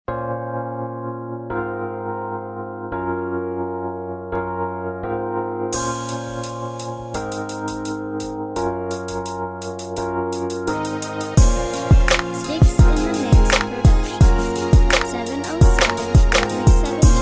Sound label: laidback